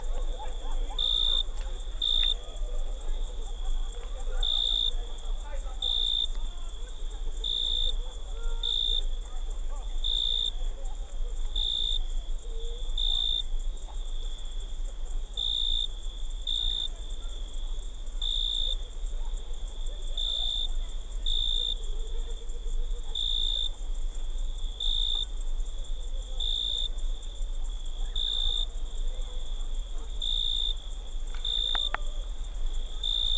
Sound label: Insects at Night Unije Island Croatia